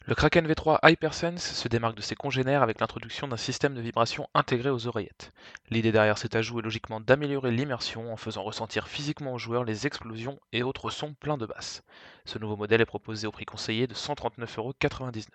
soundtest kraken v3 hypersense

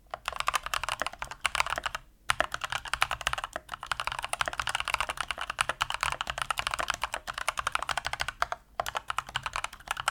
asus rog flare II animate soundtest

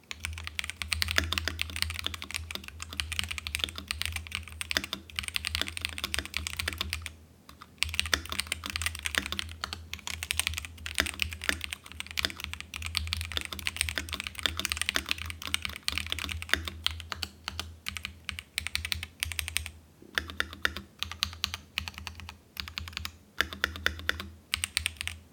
asus rog azoth soundtest

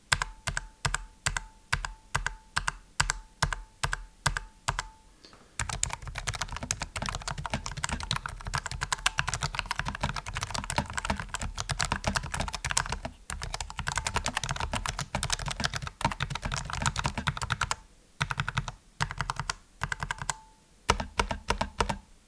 logitech g515 lightspeed soundtest

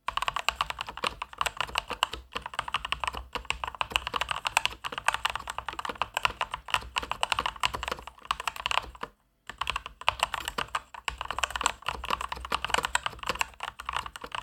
soundtest corsair k70 core

